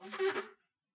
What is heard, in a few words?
clean